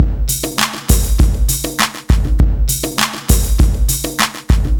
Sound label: rhythm divide, 100bpm